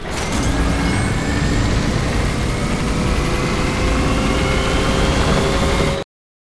power up; Titanfall 2

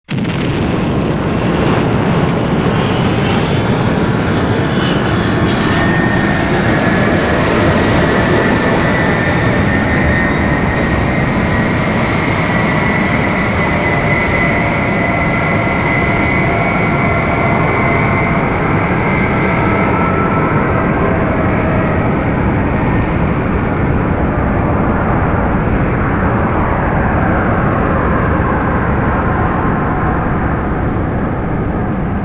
large ship takeoff; Titanfall 2

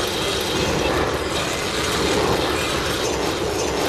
zipline loop; Titanfall 2

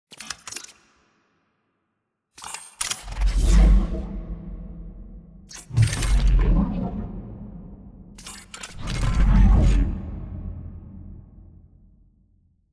control room wait reverb; bt; beacon; Titanfall 2

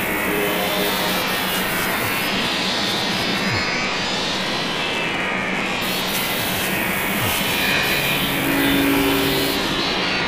Dome Panel Glitch; Boomtown; Titanfall 2

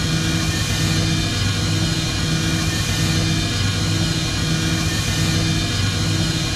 Sentry Turret Scanning Loop; Boost Card; Titanfall 2

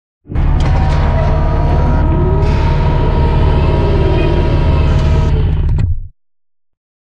Giant Floor Panel Assemble Final Lift Servo; Boomtown; Sideways Town; Titanfall 2

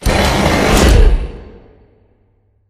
Center Stage Raise; Titanfall 2; Boomtown

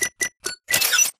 Drone Deploy Beeps; Titanfall 2

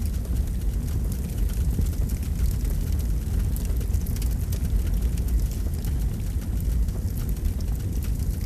fire loop medium flame; colony; Titanfall 2

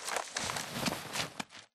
crawl grunt; Titanfall 2

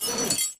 zipline detach; Titanfall 2

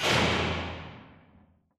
radar tower stop clunk; Titanfall 2

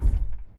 footstep jog; Titanfall 2

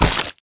Mvmt FS Jog Mud Layer; AI Stalker; Titanfall 2

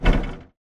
bt footstep jog parts; Titanfall 2

